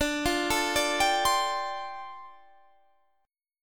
D Minor 11th D down Guitar Standard; Dm11 arpeggiated; JGuitar

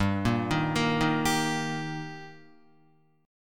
G Minor Sharp 5th G Guitar, chord; arpeggiated; JGuitar

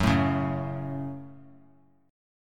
F sharp Minor F sharp, Chord; strummed; JGuitar

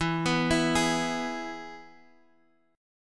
E Major, Guitar, Standard; arpeggiated; chord; x,x,2,4,5,4

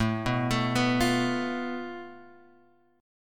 A Suspended 2nd Sharp 5th, down, Guitar, Open G

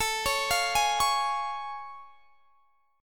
A Augmented Major 7th A; A+M7 arpeggiated; x,24,23,22,21,21

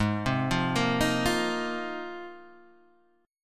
G sharp 6th Add 9th G sharp Guitar DADGAD

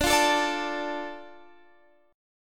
D Minor D Guitar Standard; 22,20,19,19,22,x; Dm strummed; capo=3

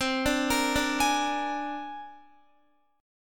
C 7th Suspended 2nd Sharp 5th C down Guitar; C7sus2#5 arpeggiated; JGuitar

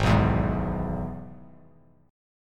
G sharp Minor Sharp 5th; G#m#5 strummed; JGuitar

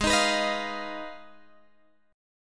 Ab Minor 6th Ab, down, Guitar, Open G

